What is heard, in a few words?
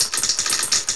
puddle drips; moria